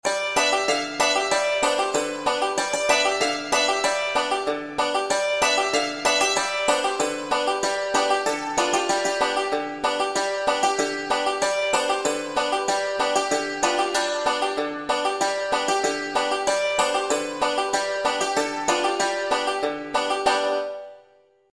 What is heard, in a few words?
g chord only, banjo; 5 string; old joe clark